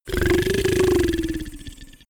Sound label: monster growl; koerpergeraeusche; comedy-cartoon; soundpool